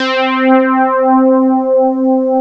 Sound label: POLY SYNT; East West - Global Sounds; SYNTHEX